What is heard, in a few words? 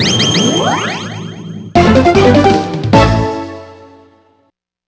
Magic card reward